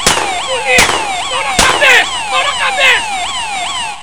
POLICIA; BRASIL

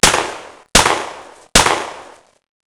TIRO; BRASIL

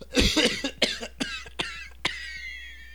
TOSSE; BRASIL

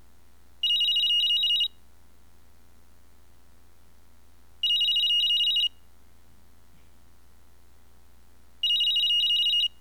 CELULAR; BRASIL

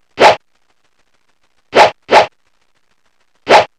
LUTA

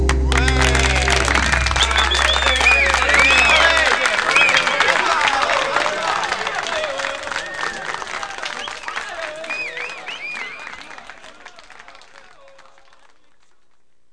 palmas; BRASIL

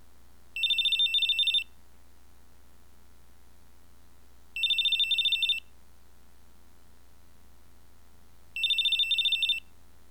CELULAR; BRASIL

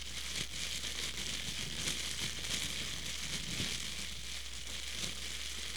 FOGO; BRASIL

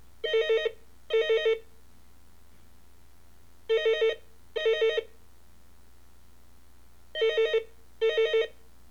TELEFONE; BRASIL

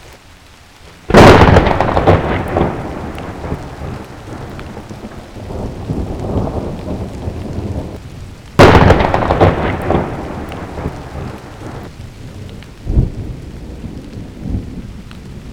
TROVAO

